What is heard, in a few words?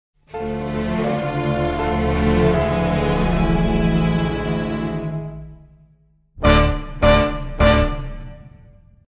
accompaniment; Our Little Secret